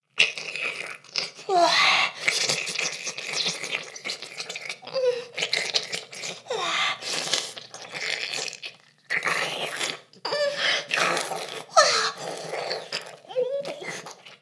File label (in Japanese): フェラ音声; ダメなメイド